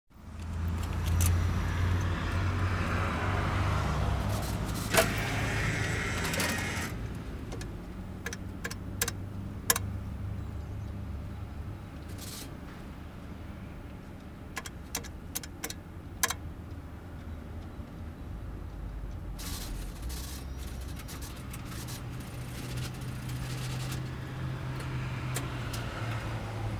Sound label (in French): Distributeur de billets